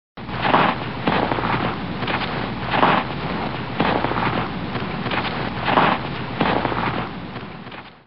Pas lent ds la neige

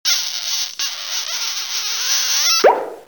Tire bouchon; bruitage; Liquides

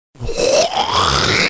Cracher; bruitage; Liquides

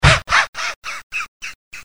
Fatigué, bruitage, Maladies

